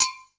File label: Agogo L, Percussion; MARKYDRUMS